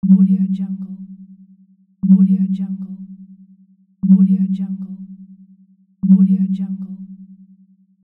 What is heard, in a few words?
submarine sound